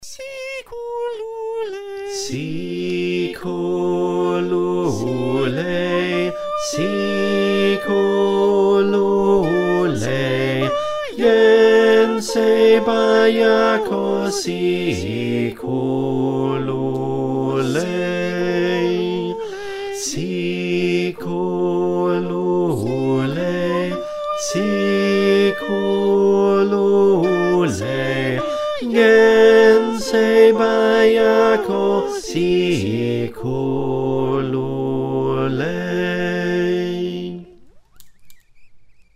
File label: Bass